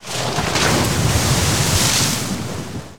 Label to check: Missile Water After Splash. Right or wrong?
Right